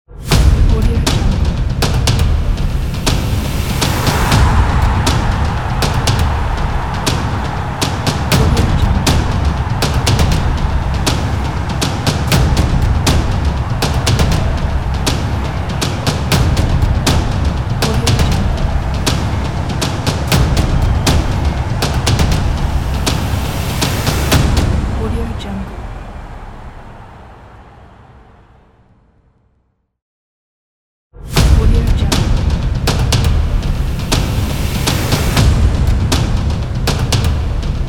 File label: football logo